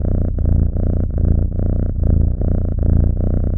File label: cat ready; Swift Cats